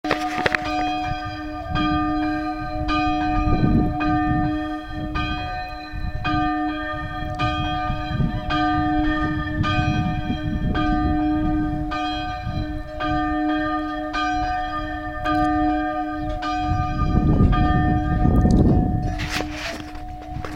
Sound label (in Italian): campane